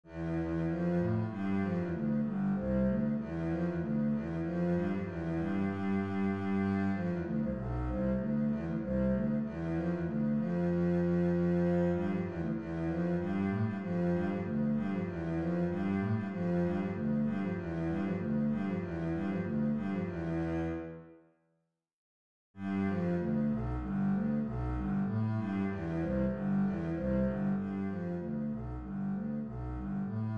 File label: beethoven bass, string bass